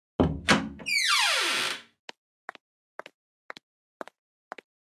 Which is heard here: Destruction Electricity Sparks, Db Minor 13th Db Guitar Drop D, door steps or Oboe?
door steps